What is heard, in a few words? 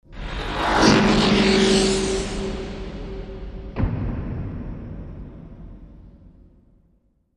Warp Out